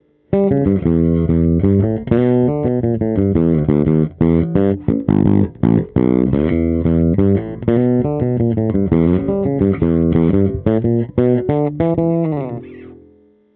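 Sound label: PJ Bridge Thump midboost Amp, bass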